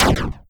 heavy artillery shot, Retro Game Weapons Sound Effects